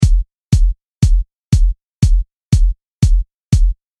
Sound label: sampling kick